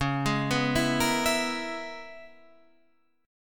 Db Minor 13th Db; Dbm13 arpeggiated; 9,11,9,9,11,12; JGuitar

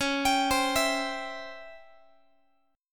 Db Minor Major 7th Flat 5th Db down Guitar; x,21,22,22,20,x; 4A,4E,5A,5D,5G#,6C#; arpeggiated

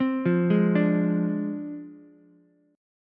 F Minor 6th, Ukulele; Fm6 arpeggiated; 5,5,4,5; JGuitar

